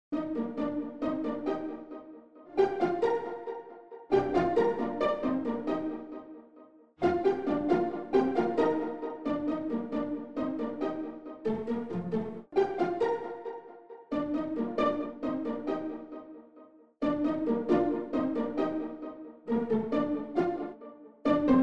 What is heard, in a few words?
Pizzi Celli Soli; la Pizzi Celli Soli